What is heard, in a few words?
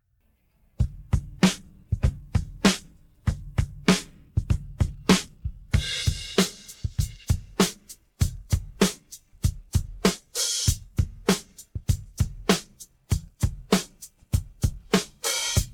pattern, drums